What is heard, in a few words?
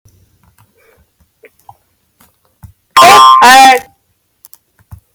goofy bell